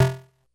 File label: Snare, Drum Machines, Korg DS-10